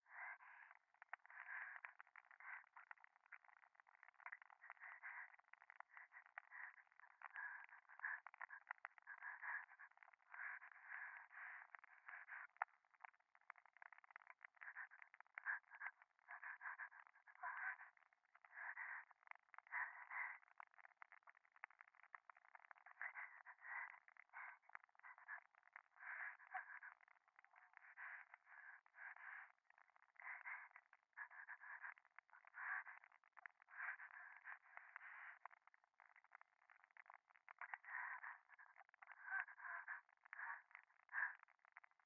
右耳 快感 吐息 暖炉

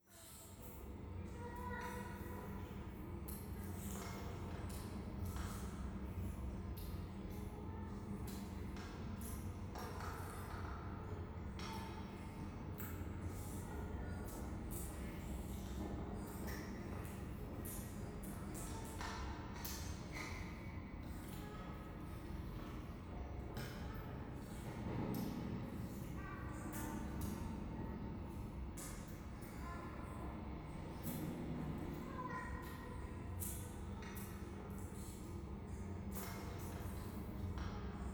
教会 イラマチオ 電車

